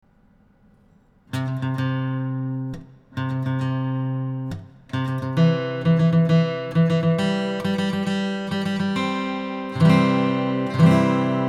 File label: Wedding March